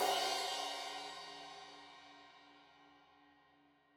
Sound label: Crash 15in L edge, Cymbals Stereo